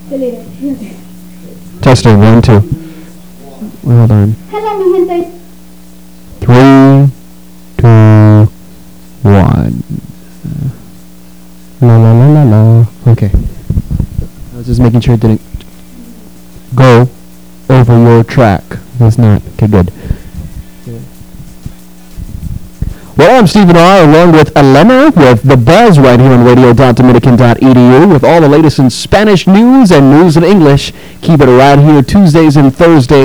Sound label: old vocals